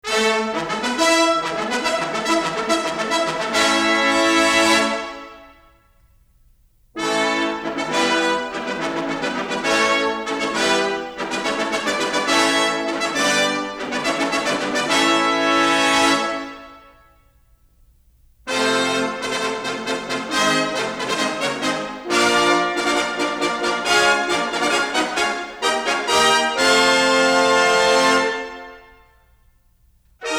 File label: Circus Fanfares; Ben-Hur（宾虚.1959）